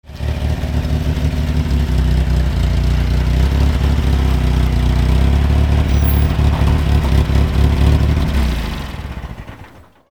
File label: bug rally type 23 idle, engine